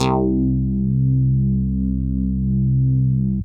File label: pulse tex bass; yamaha reface cs